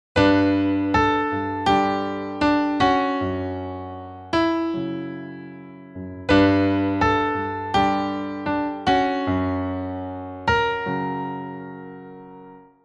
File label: PreChorus